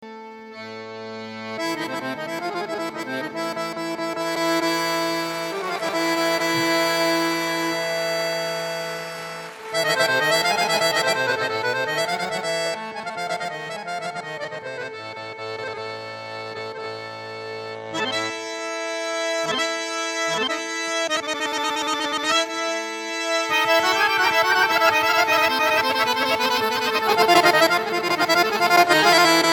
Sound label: Improvision for Accordion and Garmon